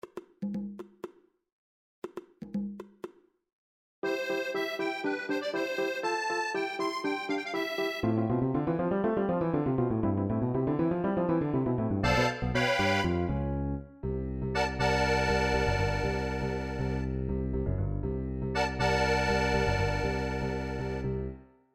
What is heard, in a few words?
jingle